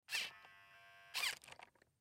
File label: Sci Fi Servo Motor Small Robot; Servo Motor Small Fast